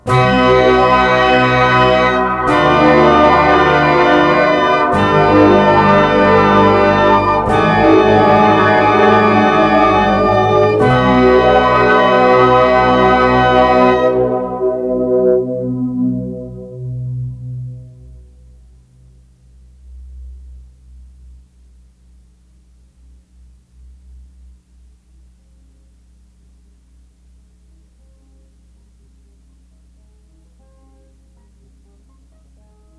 Synthesizer parts

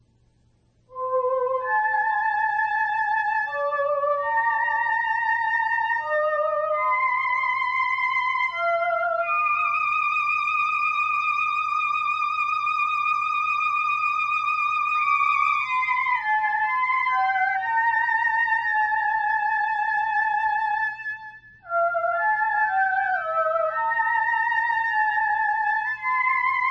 Singing DX7 "Disney Voice"

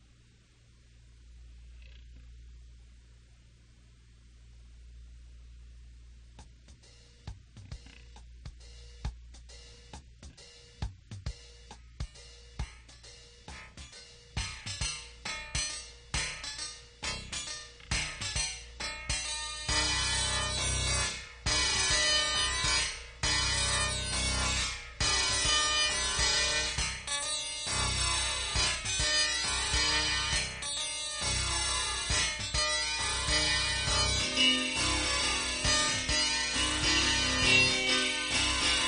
harpsichord flanged

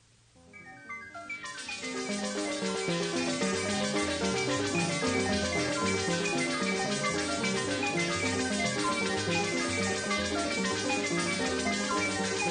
overdub of sequence, various voices, various eqs; Prophet

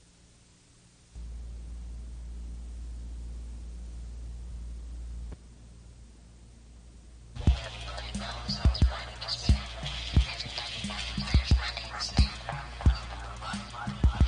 prc synth

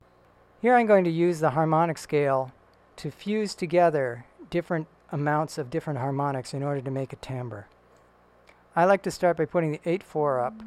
harm, Sruti Box; iPhone

